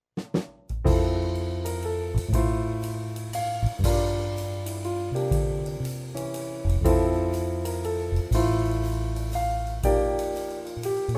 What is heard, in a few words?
Jazz flavor waltz